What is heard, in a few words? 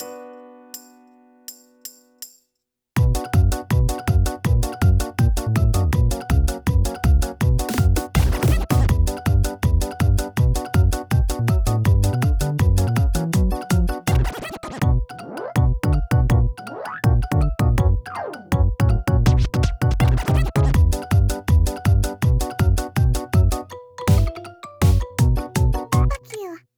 inst; Twitterに戻せ